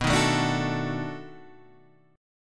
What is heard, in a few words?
B 7th Sharp 9th, guitar; B7#9 Chord {7 6 7 7 7 5} strummed